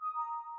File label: win